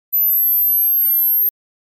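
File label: high frequency beep noise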